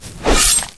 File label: mutant attack